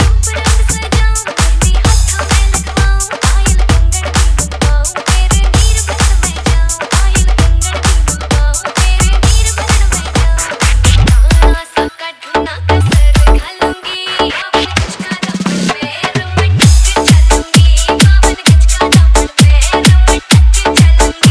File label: Hard Bass Remix Song